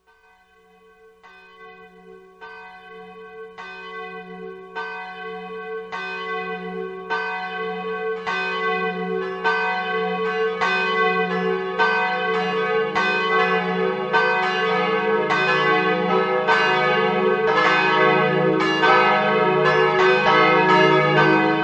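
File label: American Bells Te Deum Bells, Digital Carillons; electronic carillon bells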